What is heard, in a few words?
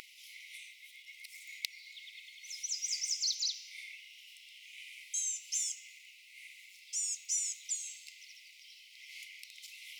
YWAR and BGGN filtered